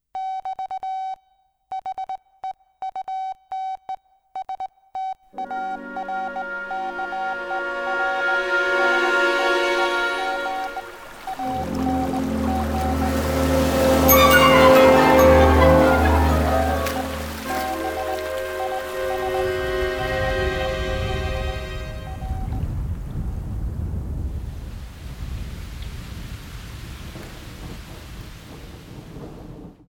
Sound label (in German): wettervorspiel